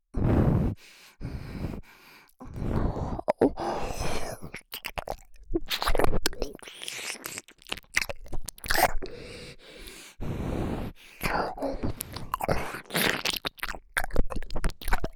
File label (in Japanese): 鼻息耳舐め; ドスケベクールなにわかコスプレイヤーの媚びっ媚び甘エッチ！